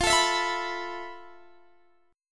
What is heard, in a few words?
F Major 7th Flat 5th, F, down, Guitar, Open D